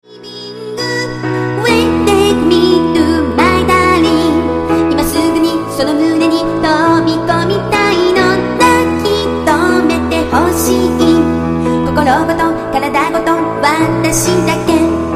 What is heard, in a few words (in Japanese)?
piano